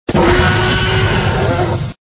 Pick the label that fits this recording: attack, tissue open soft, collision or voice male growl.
attack